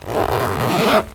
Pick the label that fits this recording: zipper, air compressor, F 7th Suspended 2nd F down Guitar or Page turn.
zipper